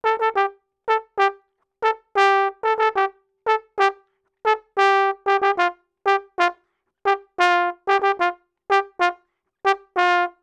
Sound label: 092 Latin Jazz Trombone Gmaj; Brass; Pro Tools Essential Loops; Digidesign